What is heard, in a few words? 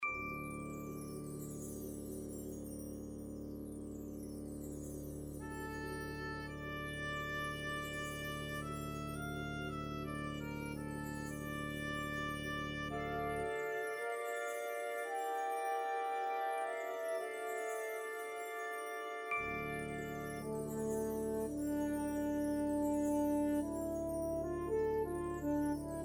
Wind Orchestra; Grace